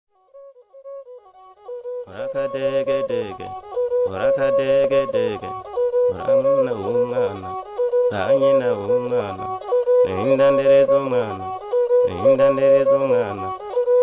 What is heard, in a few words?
indigiti, burundi